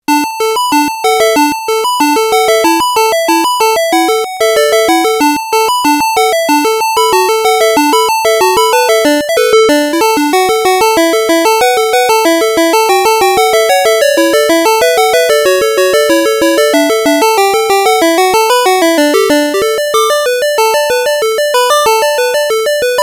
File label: Chord B